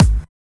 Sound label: 144 BPM Sub Kick; Vengeance - Essential Clubsounds Vol.5; Flight Kit Root Amin; Drums & Perc